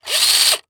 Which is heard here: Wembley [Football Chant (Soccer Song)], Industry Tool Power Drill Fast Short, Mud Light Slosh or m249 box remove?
Industry Tool Power Drill Fast Short